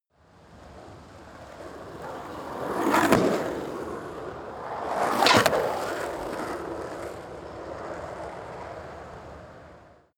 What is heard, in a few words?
skateboard single drive turn